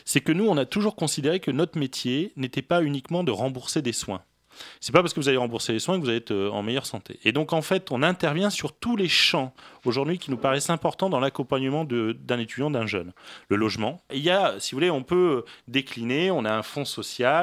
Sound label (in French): accompagnement